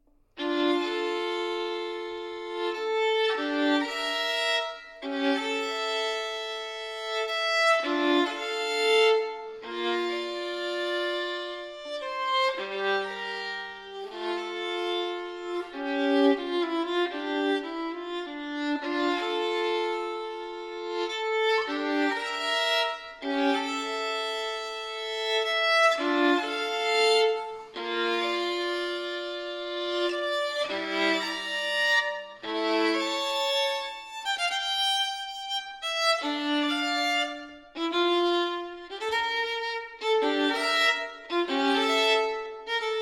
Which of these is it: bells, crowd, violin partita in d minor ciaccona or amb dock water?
violin partita in d minor ciaccona